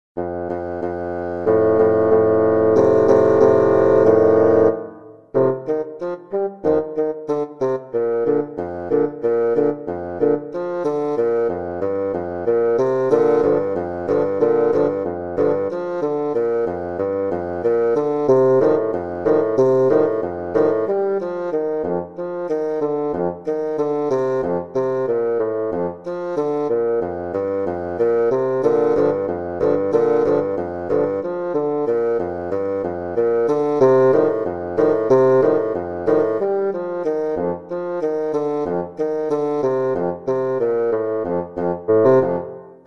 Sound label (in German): Kaktus Polka